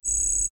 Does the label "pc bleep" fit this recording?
Yes